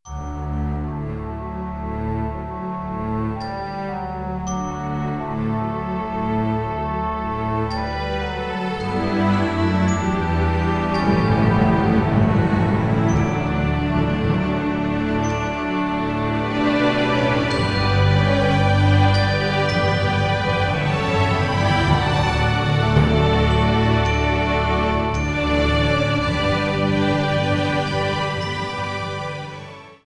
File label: Anthem Czech